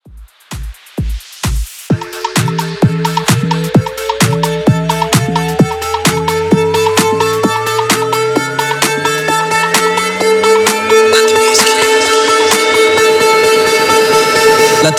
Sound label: Extended Hype 129 Bpm